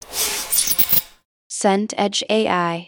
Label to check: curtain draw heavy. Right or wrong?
Right